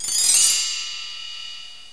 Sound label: Bell Tree; Drums; XG; GMPlay